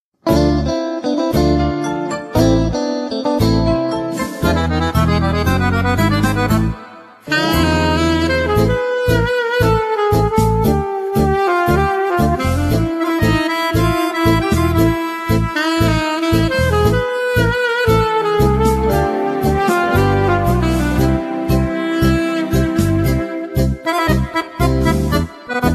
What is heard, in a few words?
tango; italia che balla